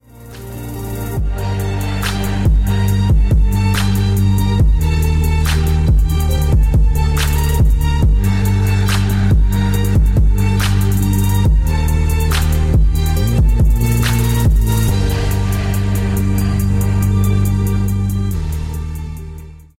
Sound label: TRAP